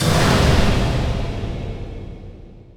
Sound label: curse proc; weapons; dota; abaddon